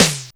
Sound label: Fairlight IIx CMI Sym Snre